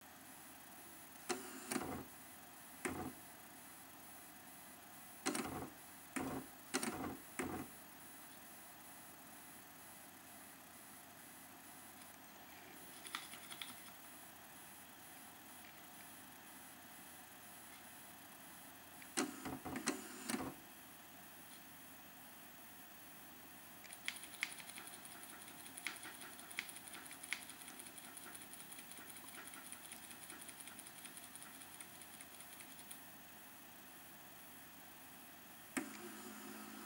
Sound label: Apple Silentype, Printers; Apple II; Peripherals